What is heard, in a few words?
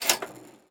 cash register down; netent